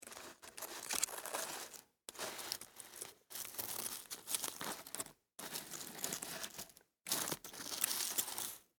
Ant Drag, Animal, Ant, Drag, Object, On, Dirt, Insect, Bug; Ants; BlastWave